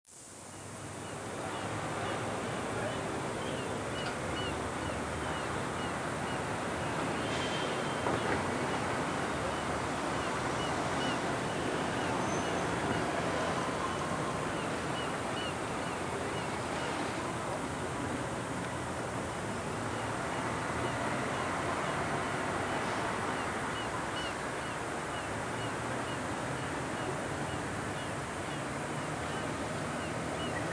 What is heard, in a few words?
bgatmos